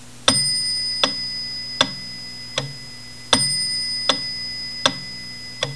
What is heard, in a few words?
Wittner Bell, metronome